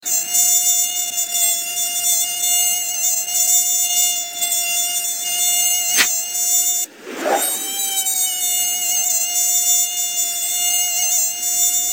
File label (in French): moustique; classe de grande section